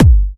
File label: Hard Kicks; Vengeance Samples